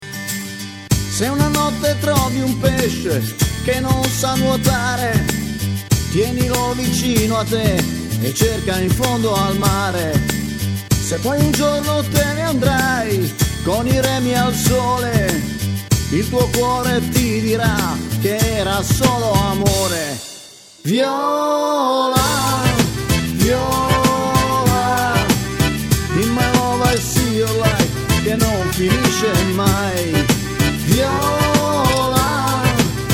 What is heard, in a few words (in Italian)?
Canto per Viola